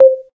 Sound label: pop